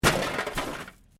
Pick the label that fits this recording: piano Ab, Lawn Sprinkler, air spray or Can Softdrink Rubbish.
Can Softdrink Rubbish